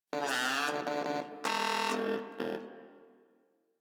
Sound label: Moa Aggressive Vocal Battle Ends; Warframe